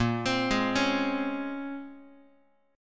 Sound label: A sharp Minor 9th, A sharp, down, Guitar; arpeggiated; x,6,3,6,5,x